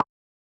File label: Yamaha DJX 2B, HH56 T9 Filt Closed